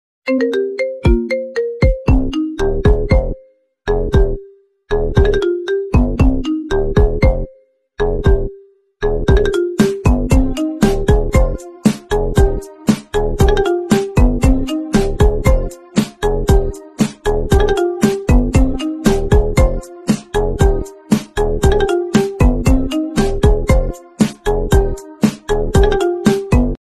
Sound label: ringtone